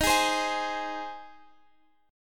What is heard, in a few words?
G sharp Major D sharp